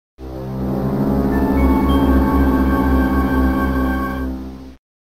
music unknown item acquisition; metroid zero mission

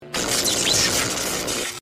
electrocute

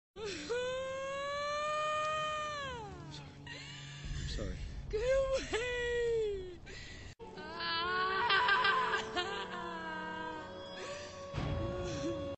crying get away; netiri

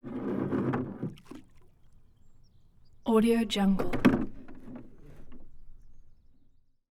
slip out

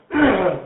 throat clear

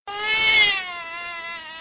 baby eheh

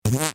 fart

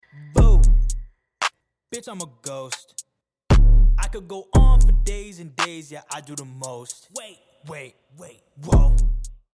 boo

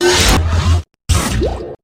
portal gun sound effect

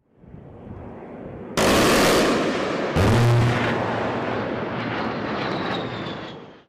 brrt

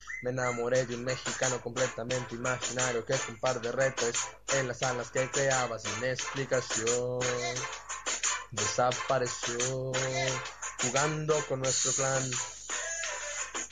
rap

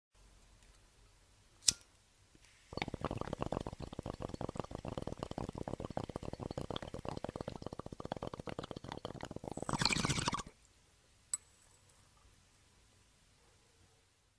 bong rip

